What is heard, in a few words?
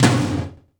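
POWER KITS, tom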